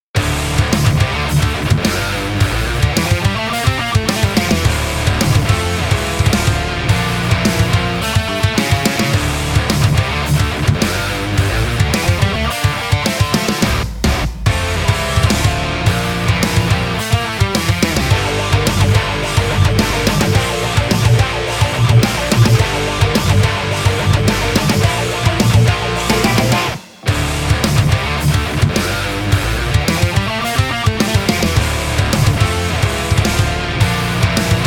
metal

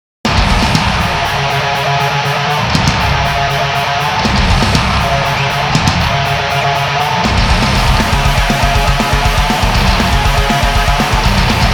no vocals, grindcore

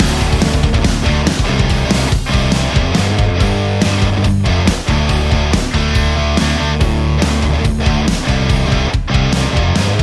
tone test rock

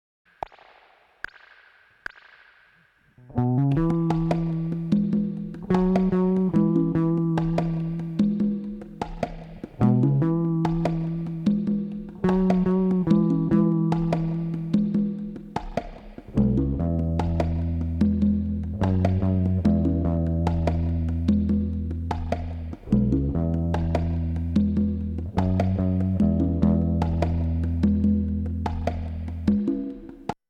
246 pitch issue; tascam 246

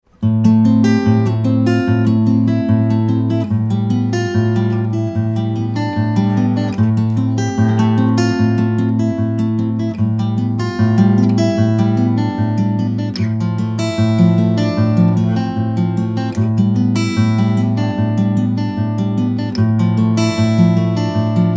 a picking; mics

